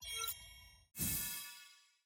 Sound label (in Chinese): 道具使用（体力恢复）; nba; 战中